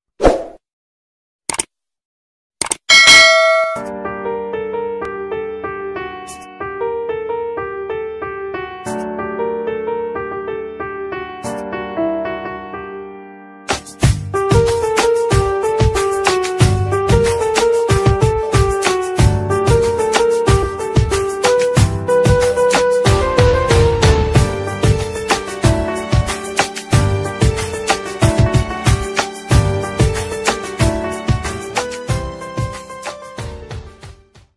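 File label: love background music ringtone; shashi movie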